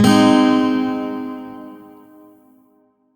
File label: Shawzin Min Chord I; Music; Warframe; Lotus Shawzin